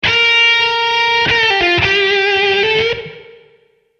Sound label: C 90 105 blues lead